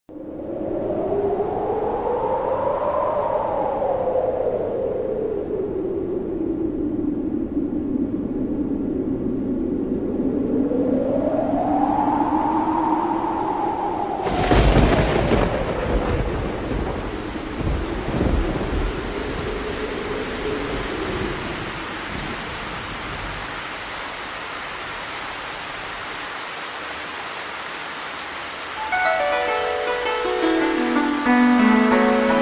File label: menu; Daikatana; music